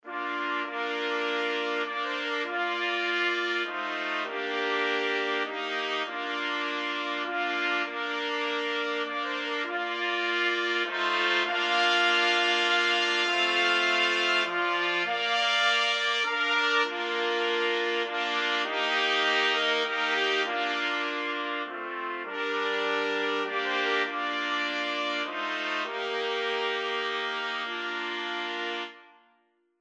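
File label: 4 trumpets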